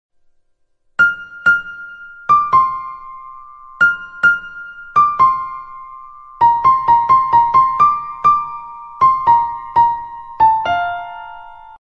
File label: instrumental, ringtone